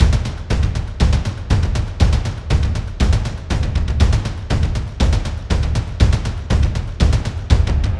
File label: Perc Stem Loop, 120, Full; Drums; Perc Construction Kits; Ghosthack; Quantum Force 4; Enter The Void